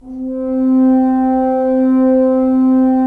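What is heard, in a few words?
Solo French Horn, Brass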